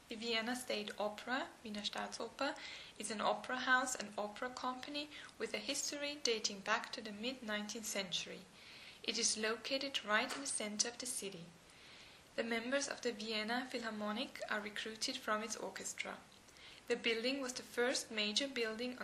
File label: Opera